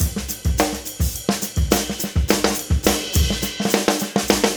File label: NuJazz Drums, Jazz; Digidesign; Pro Tools Essential Demos; Essential Loop Demos; Demo Session - Jazz